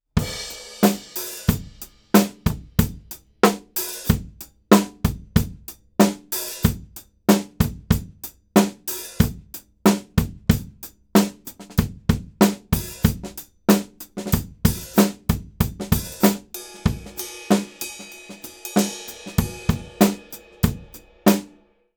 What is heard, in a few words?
TEST drums; apogee mic